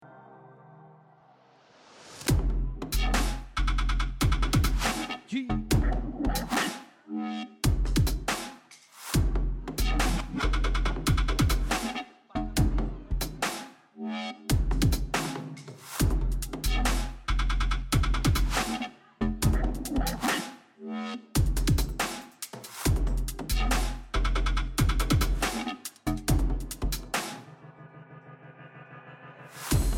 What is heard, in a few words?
Deep Dark Dubstep